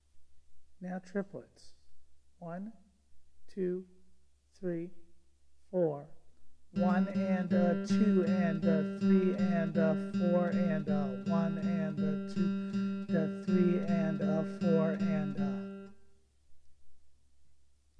SWING, guitar

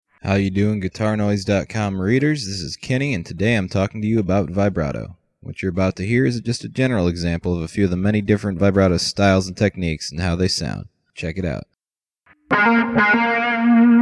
Vibrato Intro, guitar

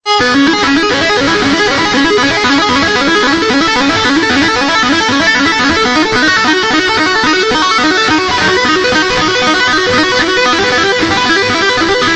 hammer pull tap

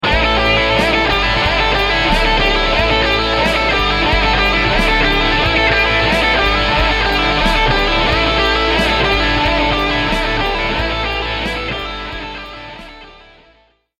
repetition lick

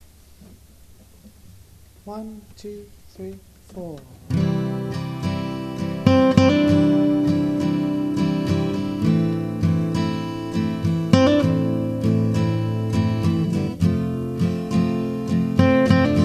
two note

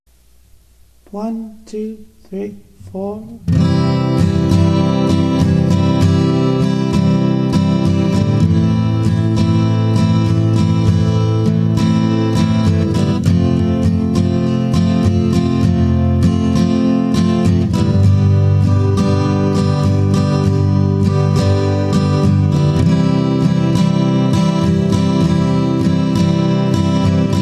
SOLOING, guitar

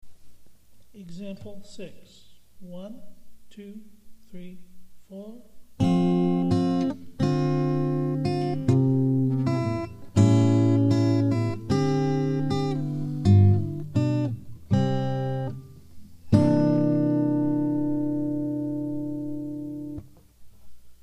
JINGLE

